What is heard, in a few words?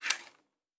Arrow Handle; Survival Game Sounds - Lite Edition; Combat and Hunting